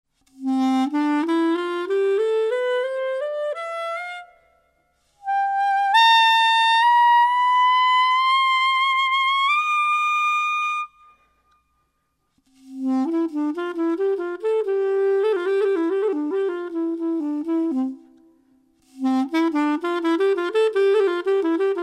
clarinettes

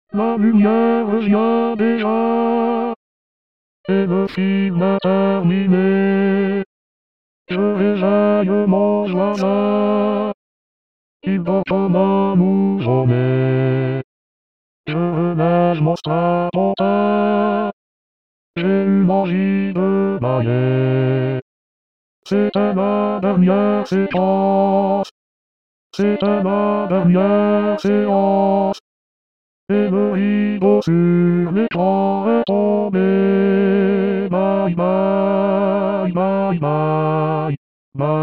hommes

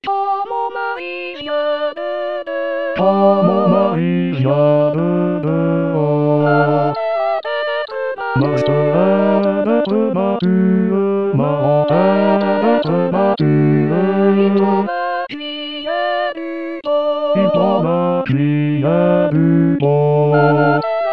Quand mon mari Basse

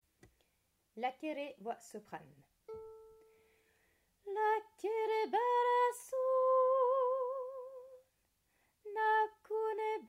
Soprane

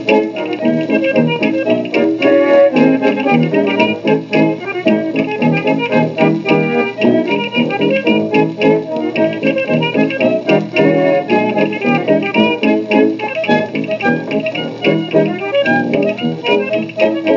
Polka Irlandaise

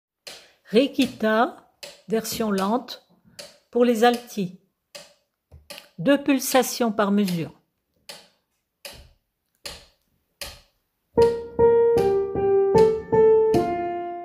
couplet alti

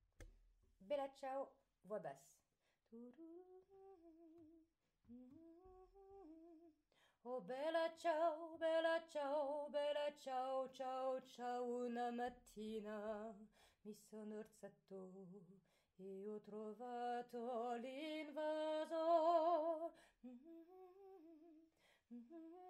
Basse; Bella Ciao

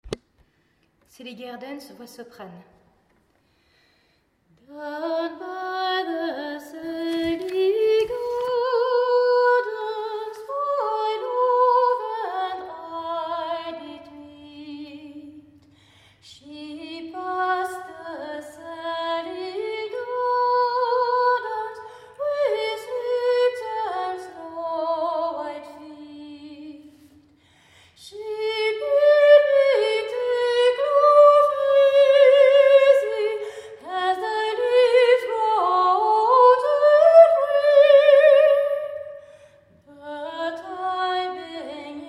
Soprane; Sally Gardens

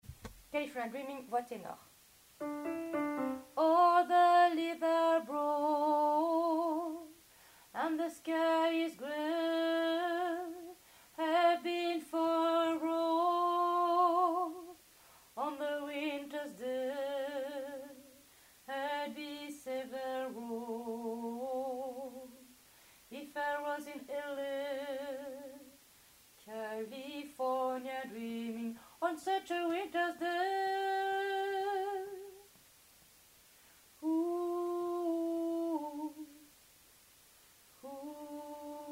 tenor

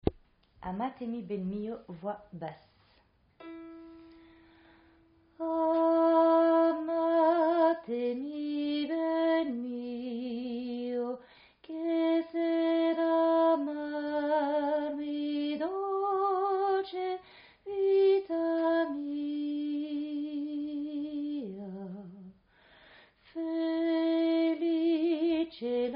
Alti basse; Amatemi ben mio

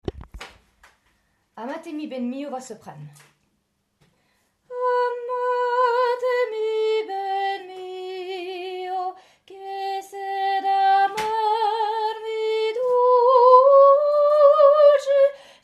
soprane; Amatemi ben mio

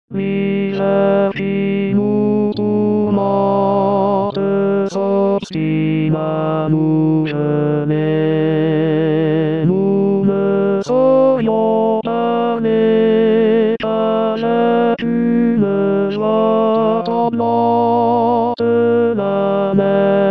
Tenor; Lully - le choeur des trembleurs

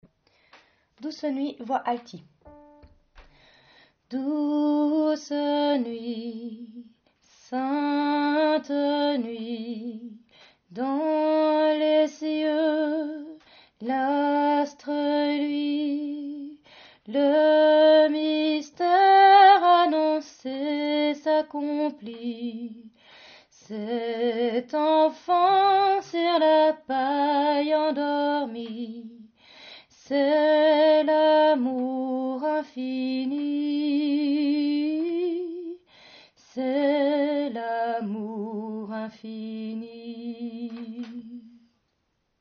Douce nuit Alti

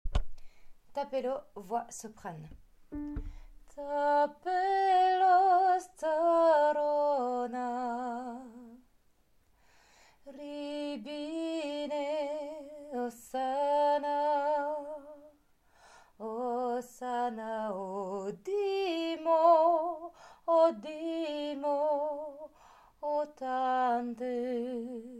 Soprane

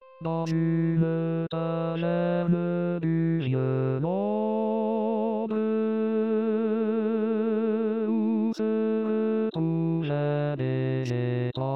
tenor

